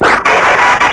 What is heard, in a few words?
Scream